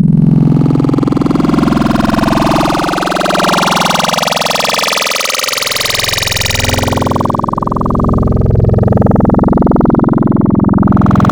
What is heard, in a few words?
Destroy, Growl Tables; Cymatics; Growl Tables Vol 3; Serum